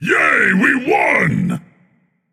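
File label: non-verbal yay; smite; atlas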